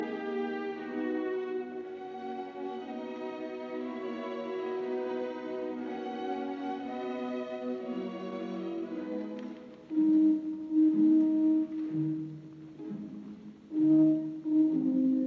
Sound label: Symphony in E flat major Romantic; Bruckner; WAB 104; 1881 version ed R Haas; II Andante